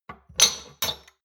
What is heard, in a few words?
shot glasses grab multiple hard; berufe; gastronomie; soundpool